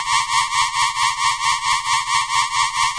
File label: ufo take me away